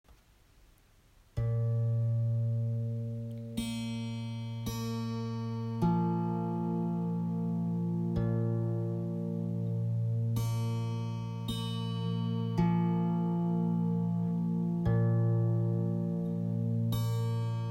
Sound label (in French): Tanpura et voix intuitive